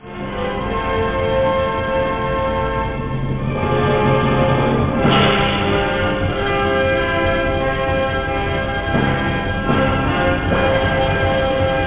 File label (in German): Hymne; Die Odyssee german